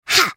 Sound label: Sad Mummy effort; Character Voices; English